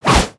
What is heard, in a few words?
Massive Spear Swing